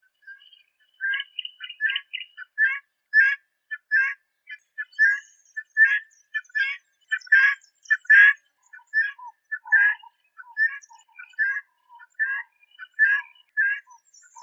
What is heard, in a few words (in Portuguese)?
Canto da galinha dangola